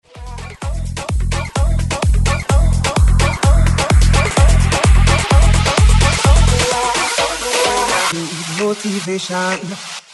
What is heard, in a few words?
128 BREAKMIX; DJDOLLS